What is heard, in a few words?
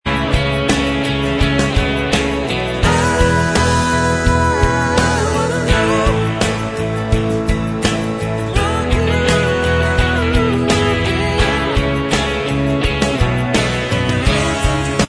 Bvox Track